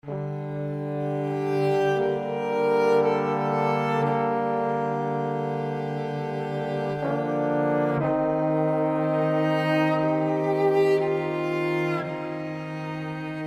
Dark Cello and Horns

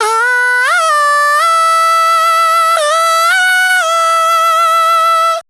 174 vocal loop ah rise and fall dry E min

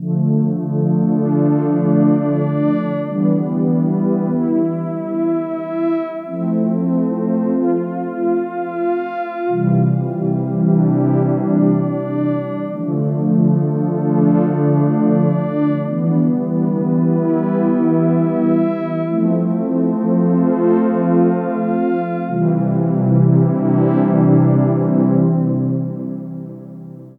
Synth Rhythm 75bpm D Lofi Chillout

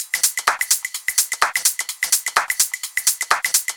TOP LOOP 127 BPM

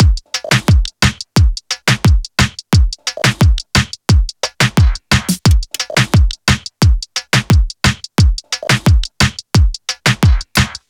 88, loop drums full; sono

